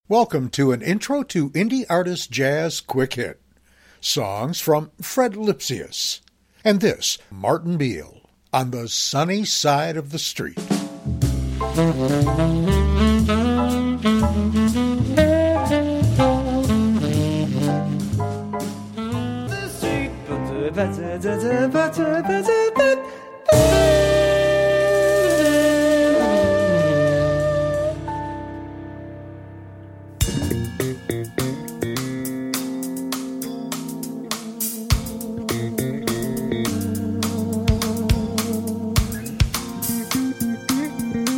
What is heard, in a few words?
jazz song